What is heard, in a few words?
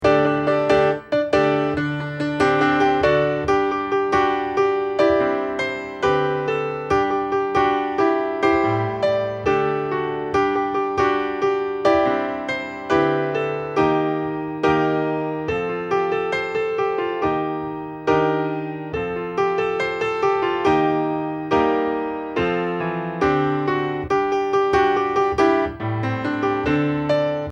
accomp